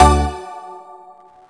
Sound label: SYNTH